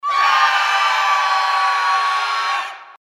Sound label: scream group